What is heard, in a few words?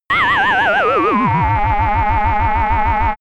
landing ufo